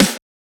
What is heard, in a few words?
Snare; FLPRO